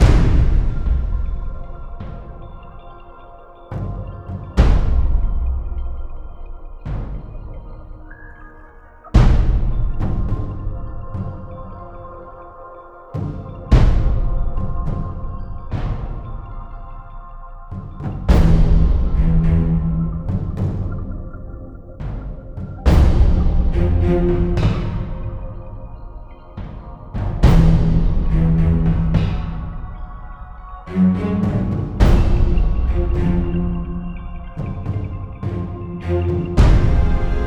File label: Whispering Caves; Impire